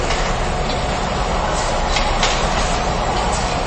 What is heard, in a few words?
tropics